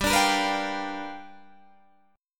G 7th Sharp 9th G chord, Guitar Open D

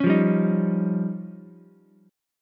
F 7th Suspended 2nd Sharp 5th, Ukulele; F7sus2#5; strummed; JGuitar

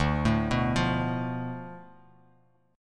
D Minor 6th; Dm6 arpeggiated; JGuitar

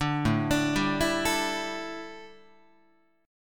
A 7th Suspended 4th down Guitar, chord; A7sus4 arpeggiated

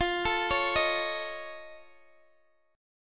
F Augmented 7th, Guitar, Open D; x,x,15,15,16,13

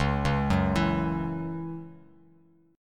Db Minor Double Flat 5th Db, Bass Standard; arpeggiated; Dbmbb5; JGuitar

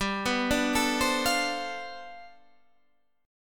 G 11th, Guitar, Standard; G11 arpeggiated

